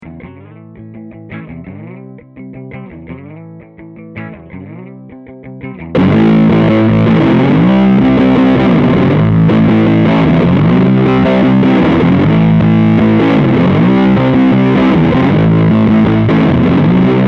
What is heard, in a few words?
clean then full